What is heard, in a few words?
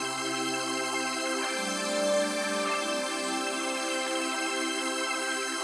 One Full Pad, пэды